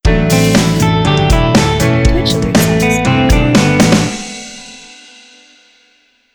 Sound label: Rock Sound Subscriber